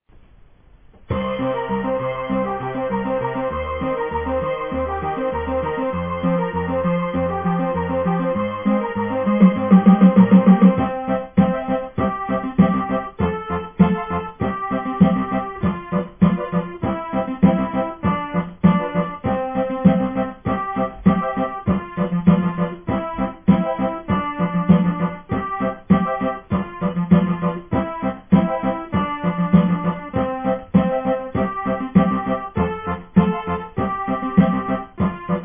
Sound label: Twinkle twinkle little star lenta